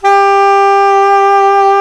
SAX SOP MF, Soprano Long

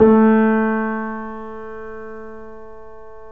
3D Pianos Yamaha C7; E-MU Producer Series Vol. 5

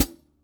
HH PD, Drum 1 Dry; East Collexion

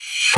Reverser; Effects; Electronisounds

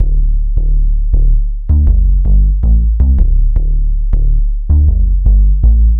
Bass, Futurist Drum & Bass; Sonic Foundry (Sony Creative Software)

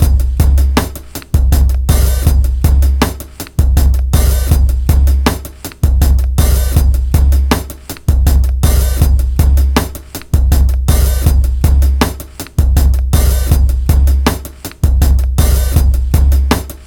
160 bpm, Breaks; SIX; 90 s Sample CDs; Planet of the Breaks

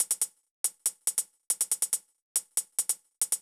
Beat - Hats, Grime, 140bpm; Zero-G Sound Sense Grime Scene; GS Construction Kits; Just Flex

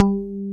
G-1 PICKED, METALLOID; AKAI S-Series CD-ROM Sound Library VOL-7

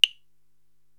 Woodblock; Korg TR-Rack

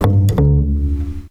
Double Bass F; Total Drum&Bass

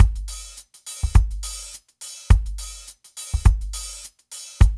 DRUMS, Funky Ass Loops; East West

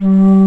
BASS FLUTE G2; AKAI S6000 CD-ROM - Volume 1; BrassWind

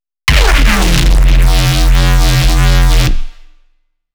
reese F, bass

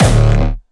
Distortion Kicks Kick D1; Vengeance - Essential Clubsounds Vol.4; Bassdrums; Bonus TonalHS Kick

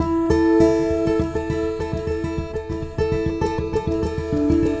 Guitar And Synth

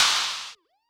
kit, Yamaha Motif-ES8

